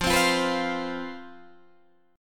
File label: C Major 7th Suspended 4th, F, guitar chord